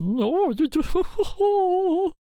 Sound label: Chariot Attack Vocals